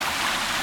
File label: magic water veil